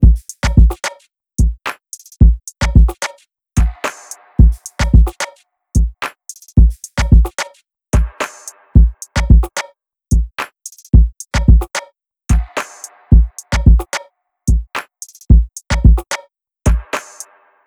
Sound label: Drums; Cymatics